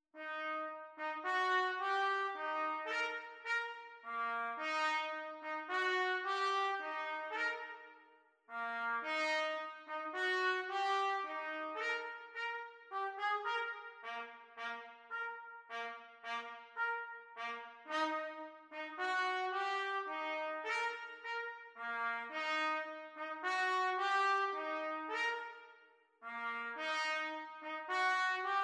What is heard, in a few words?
Trumpets Duet; Tricky Trumpets